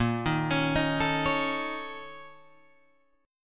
A sharp Minor Major 7th Double Flat 5th A sharp Guitar Open G